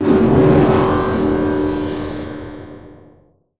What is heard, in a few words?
magic curse